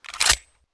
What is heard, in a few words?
vp70 mag in